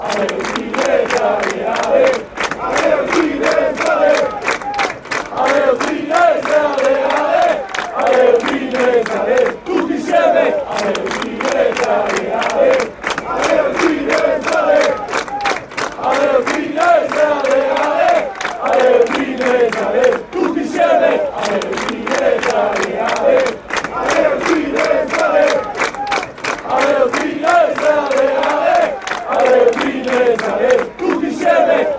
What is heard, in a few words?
fan chants, football; udinese